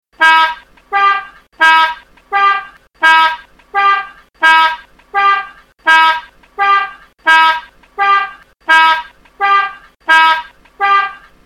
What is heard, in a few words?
Ambulance Chevrolet 1947; udrykningsho